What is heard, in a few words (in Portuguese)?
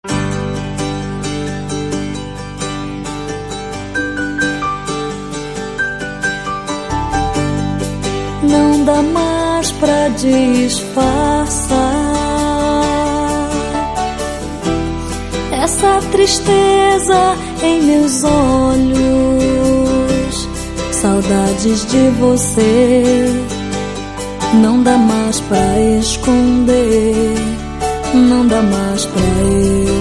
banda acustico